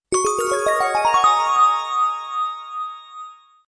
Muzykalnu perezvon; Музыкальный перезвон; Перезвон колокольчиков создаёт волшебные звуки

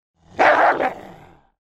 volk s laem i rychaniem nabrasyvaetsja na protivnika

